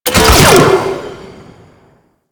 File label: Beam Gun Fire 3D; Player Path Beam; Fomorian